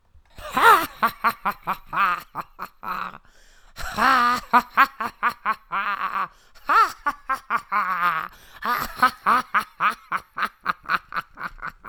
Old Miser Laugh

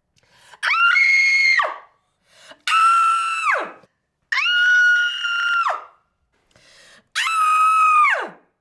Woman Screaming

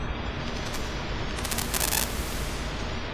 Alien Electricity

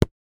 Studs Gummi

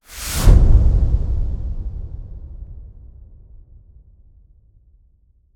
Big Impact With Swoosh

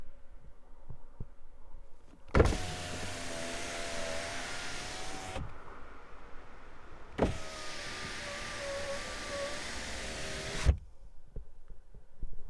Car Electric Window

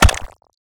Meaty Damage Impact

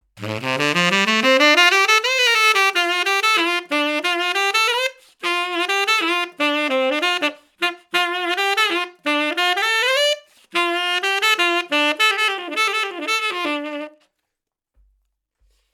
90bpm Sincere Riff 6 Bar

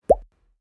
Pop

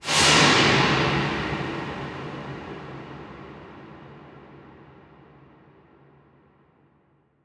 Deep Swoosh

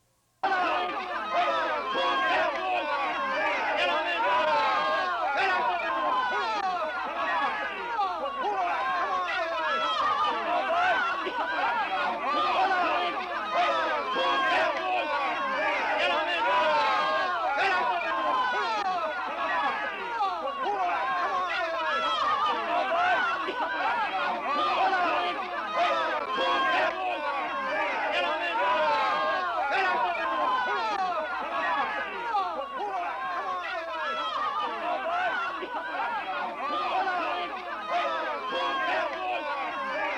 Men And Women Yelling Encouragement

